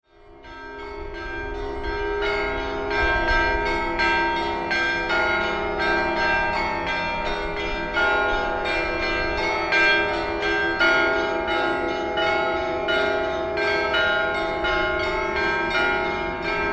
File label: omsk church bells